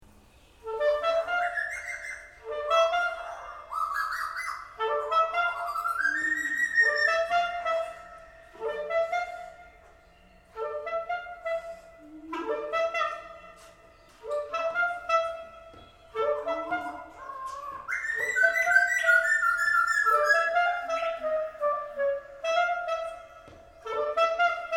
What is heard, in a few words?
whiteCrested